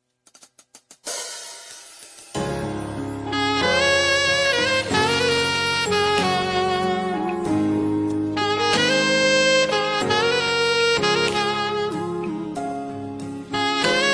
instrumental track

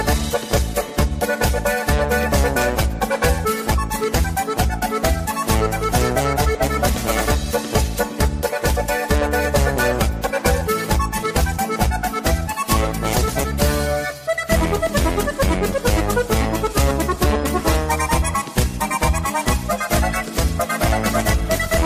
Polka

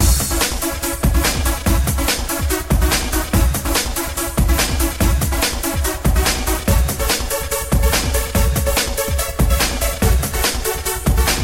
Break Beat, ringtone

